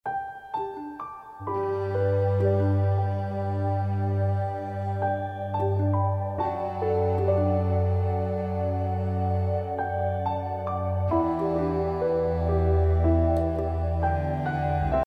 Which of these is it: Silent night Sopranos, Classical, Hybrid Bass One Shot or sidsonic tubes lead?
Classical